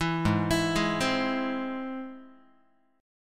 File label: G sharp Augmented, Guitar; arpeggiated; 7,4,7,6,4,x; 4A,4E,5A,5D,5G#,6C#; JGuitar